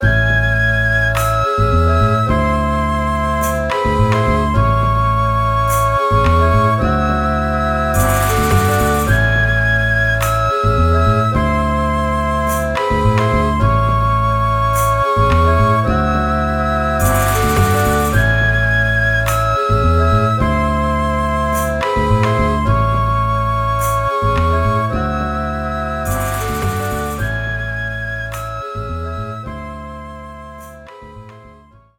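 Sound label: BGM Salon Result Lose China; clubhouse games 51 worldwide classics 2020 switch gamerip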